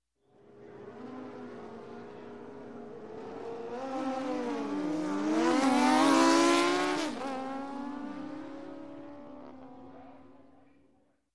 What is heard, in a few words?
Motorcycle, Race, Drag 2 Bikes Rev Up & Race By; Sound Ideas; Series 2000